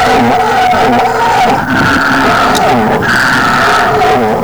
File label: bellow, cow